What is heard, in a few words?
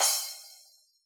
splash cymbal, Yamaha DJX Rock Kit